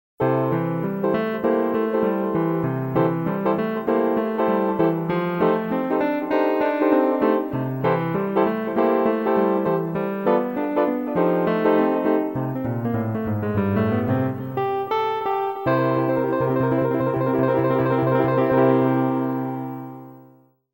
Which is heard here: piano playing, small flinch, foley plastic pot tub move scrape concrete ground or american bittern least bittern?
piano playing